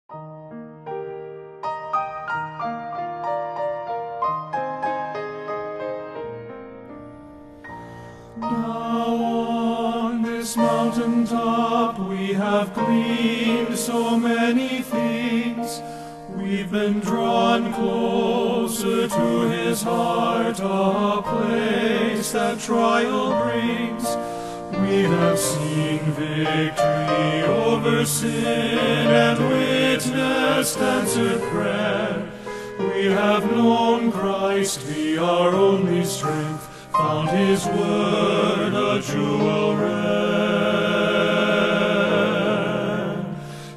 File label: men's chorus